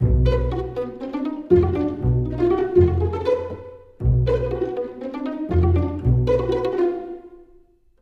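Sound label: jungle world music, joyeux baston pizz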